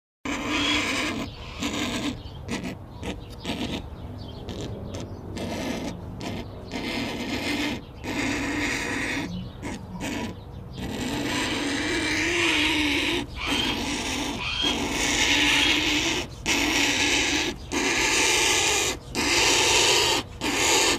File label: turkey vulture call, birds forest